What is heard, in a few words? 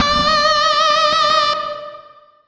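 guitar metal vibrato; Single Shots; Misc; Sonic Foundry (Sony Creative Software); Ugly Remnants VOL-2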